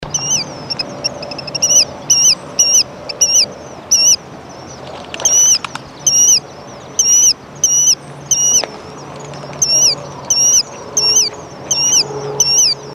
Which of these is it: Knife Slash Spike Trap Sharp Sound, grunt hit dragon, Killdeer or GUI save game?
Killdeer